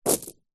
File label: household necklace plastic pearl set down or drop on carpet